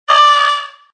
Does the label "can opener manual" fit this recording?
No